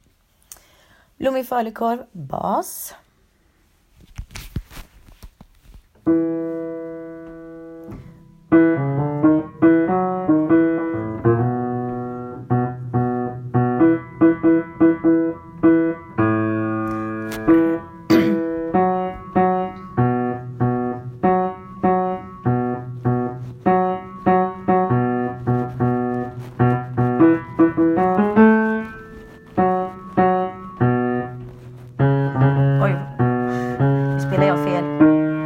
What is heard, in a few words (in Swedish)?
falukorv bas; stambank